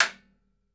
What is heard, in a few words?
SURDO, Steel Percussion; E-MU Producer Series Vol. 7 – Old World Instruments; World Combos